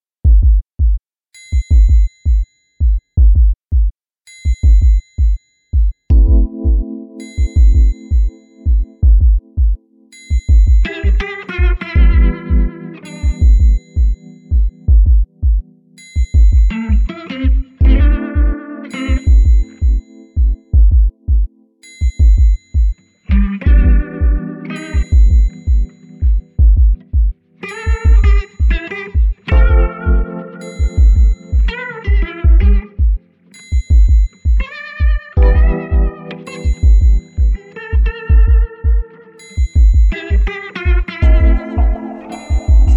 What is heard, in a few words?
chill guitar beat